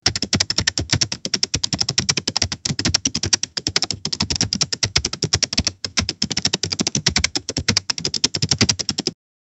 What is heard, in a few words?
hacker sound writing on k, computer